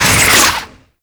Cannon Projectile Whistle; Pirate; Warframe

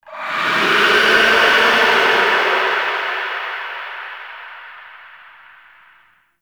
Use Ability Verb; Enemies; Warframe; Tau; Sentient Dactolyst

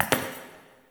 Idle Single Step; Tagfer; Warframe; Ambience; Entrati

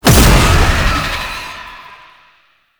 Cast Impact; Warframe; Uriel; Demonium

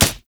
Melee Fist Impact Generic; Fists

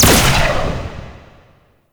Laser Explosion; Enemies; Weapons; Warframe; Tau; Sentient Dactolyst

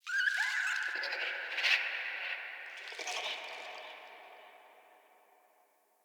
Trapped Animal Chatter Distant; Ode Sky Fortress; Tunnel

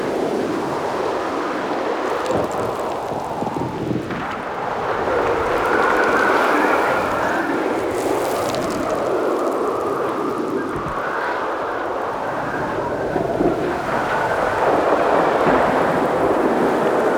Settlement Exterior Ambience; Warframe; Grineer Settlement

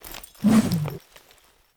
Overhead Smash Attack Whoosh; Enemies; Warframe; Grineer Nightwatch

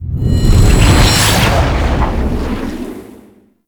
Death Fade; gameplay; Warframe; NineteenNinetyNine; Stage12PartyOfALifetime

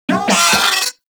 Moa Vocal Pain; Warframe

